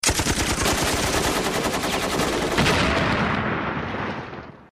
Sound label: call sms; ringtones